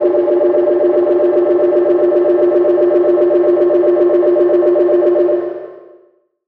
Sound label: Tape Delay Feedback; radiosonics